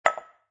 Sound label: Foley, Ceramic Bowl, Small Empty Bowl Placed Down On Concrete; Set Down